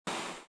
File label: torch fire; weapons; grubs